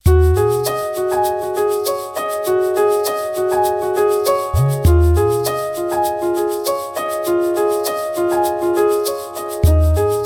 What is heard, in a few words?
Fluto; Samsung SM-W2019; ringtones